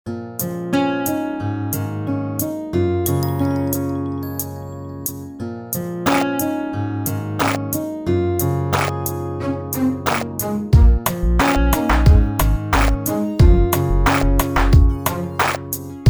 ringtong; Obi S450

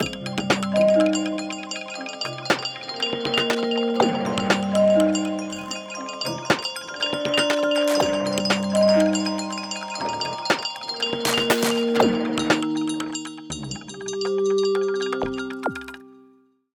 Mineral chimez, ringtones; Google; Google Sounds; Gems

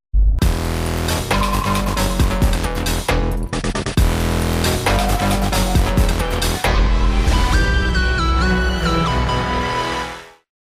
hiphop; Turkcell T40

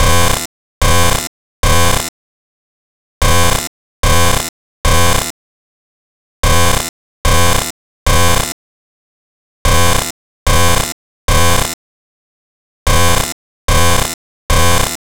Warning; Sony Ericsson; PlusFourSix; Patti (W902)

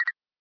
camera focus, ui; Sharp SH-01D

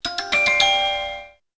Alert

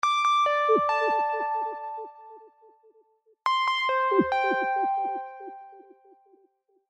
Muzzy Tone

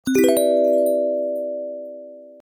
glockenspiel, notifications; HTC EVO 4G LTE

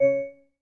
Class Harp

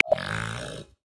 Dall Sheep; Xiaomi MIUI-14

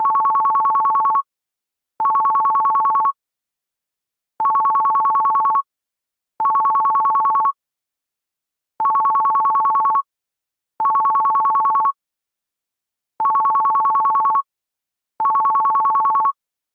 Simple Bell, ringtones; LG G Pro 2 D838

